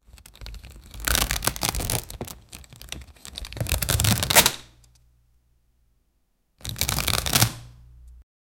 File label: screw opening sounds; ratcheting